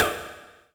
Snares, Elektron MachineDrum SPS1 MKII

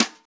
SNR XBRUSH, Brush Kit; Club-50 - Foundations Roland; Brush Kits

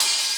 HH, 170 BPM, Drum & Bass; Ueberschall; Drum & Bass Flash Trackz

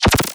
Blaster Fire, cartoon Foley Fx; musicradar; cartoon caper

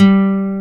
NYLON G 3, Bass Guitar; AKAI S6000 CD-ROM - Volume 1; NYLON GT

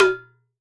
WORLD DRUMS MIXED DRUMS

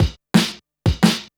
175, Breaks; Drum&Bass; EZ Rollers

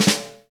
V-Pop Snare Ghost, Roland BK-5; Snares

